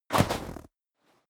step snow jump

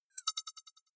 click decay high

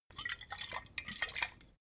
bottle vodka shake single; berufe

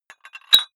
dumbbell put on very small weight hit; sport freizeit; fitnessstudio

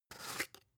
spice jar big scratch open shaky

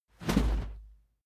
whoosh cloth; swooshes-swishes

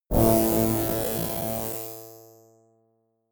space saw short; energie

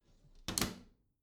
bathroom cabinet close regular; objekte; tueren

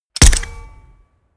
gun shot silencer designed mechanic

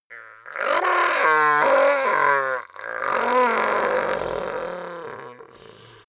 goblin groan painful overdramatic

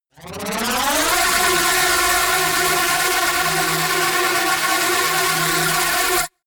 phasing wobble swell; risers

